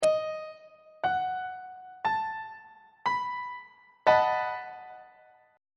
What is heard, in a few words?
a double sharp dominant 7th 1st inversion; treble clef